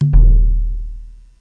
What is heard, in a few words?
log; proteus2